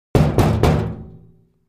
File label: Cabinet Door Knock